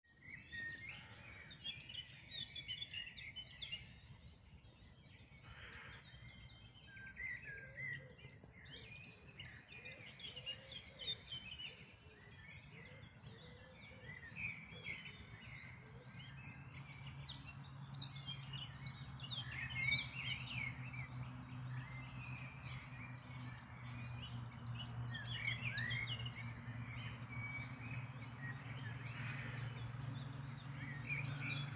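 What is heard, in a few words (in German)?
Dawn Chorus, Vogelgesänge vor Sonnenaufgang; der dawn chorus 2026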